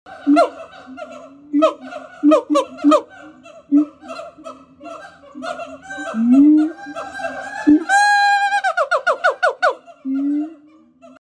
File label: Siamang; حیوان سیامانگ